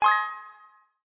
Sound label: win game sound